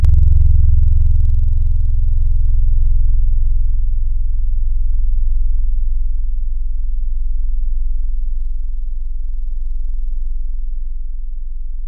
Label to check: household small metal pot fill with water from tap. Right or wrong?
Wrong